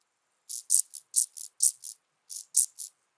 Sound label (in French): criquets duettistes chant en duo